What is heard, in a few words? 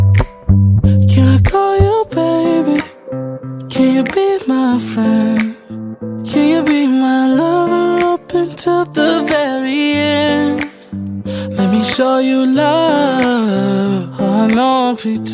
Ringtone